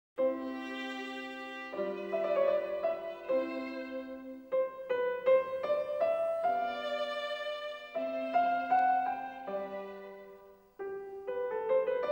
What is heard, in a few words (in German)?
Violinsonate C-Dur; KV 404; (Fragment); Andante; WA Mozart - The Complete Works 170CD; Volume 4 - Chamber Music-Violin Sonatas-Church Sonatas